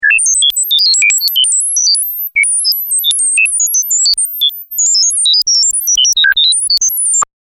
Computer Calculating Operation, Sounds Like A Computer That Makes Calculations; Computers